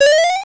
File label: power up; retro synth SFX